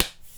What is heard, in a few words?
Slap the Melon; Micro Pack